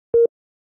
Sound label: computer beep; NCH Library